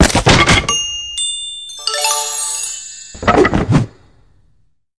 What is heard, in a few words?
Trap Level Success; the croods